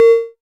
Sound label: Cowbell Hi, Modular